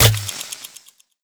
Activate Tnt; boom karts multiplayer kart racing android

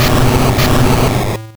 Egg Bomb; sfx; attack moves; pokemon; gen 1; rby

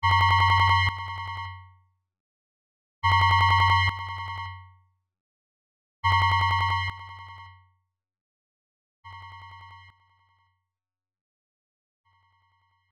Phone Tone; Akai Suishou no Hitomi; PC-98

